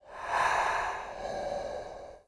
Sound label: Elf Knight Soul Idle; Seer; GamigoNA